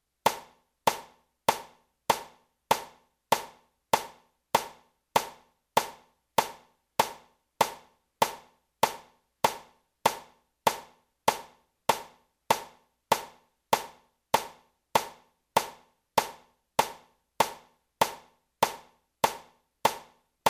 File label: 98 per minute